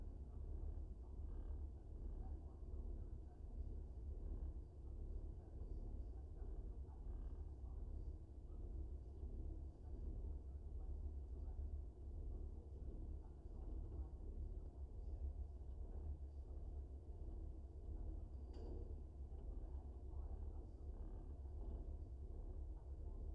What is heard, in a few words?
Ferry Beats